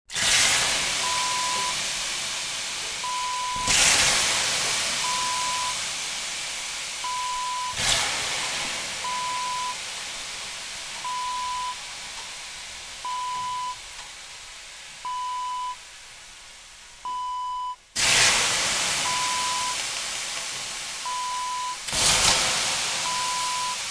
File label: sauna